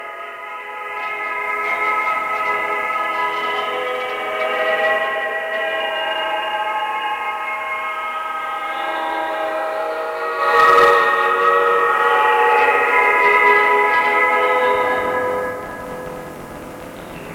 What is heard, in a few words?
turtle pad